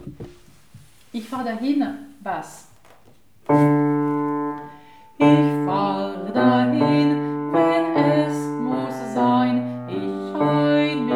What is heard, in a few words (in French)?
Basse; Ich fahr dahin